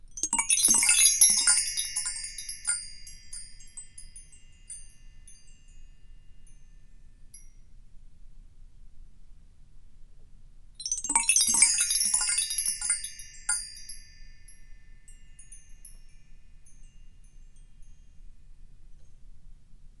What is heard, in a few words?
bell tree up down erie stylistic various speed length; fvtt sonniss gdc audio